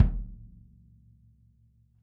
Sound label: kick, Drum Kits; Sonic Reality; Interactive Drum Kits; 90s sample cds